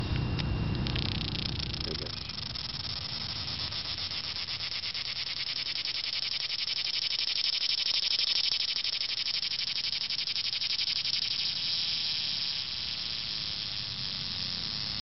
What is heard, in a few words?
Summer cicada sound